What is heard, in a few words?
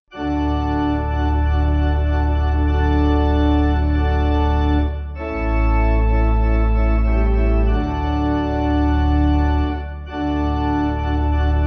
Pipe Organ, Music Backing; Herrell; N.B. Herrell